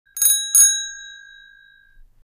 Metal bike bell ring

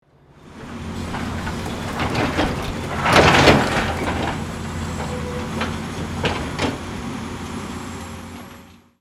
Excavator construction vehicle bucket dump

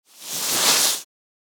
Polyester movement scraping; foley

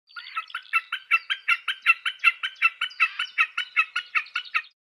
Common snipe singing

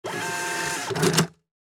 Cash machine printing receipt

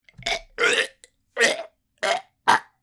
Old man choking distress gasps

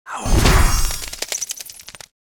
Vampire transformation bats morph

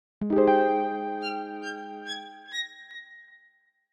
Video game reward chest opening space

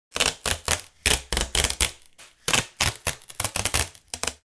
Packing tape medium and long pull

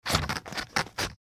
Scissors cutting wrapping paper

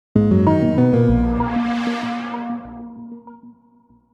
Game over lose; gfxsounds

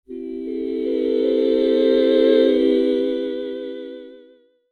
Heavenly choir swell

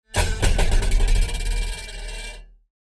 Dulcimer strings tortured texture

